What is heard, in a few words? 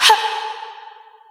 Vox Hit C3; Club Techno